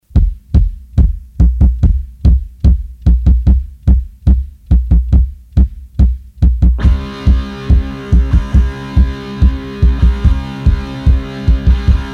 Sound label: disco